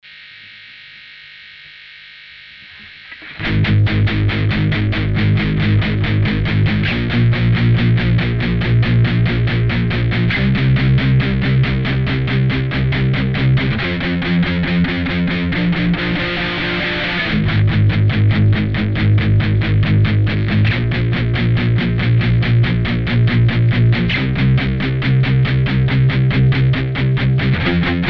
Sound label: All Guitar